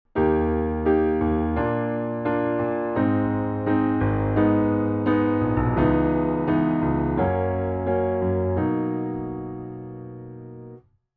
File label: enharmonic modulation